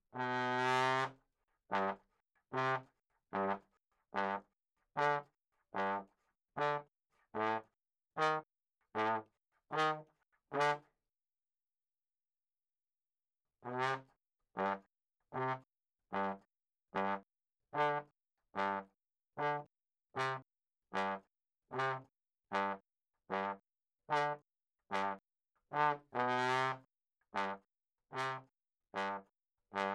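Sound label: RAGTIME trombone room L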